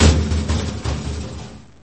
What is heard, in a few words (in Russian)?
metallicheskij zvuk musornih bakov